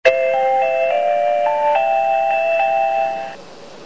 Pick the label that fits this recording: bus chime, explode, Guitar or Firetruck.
bus chime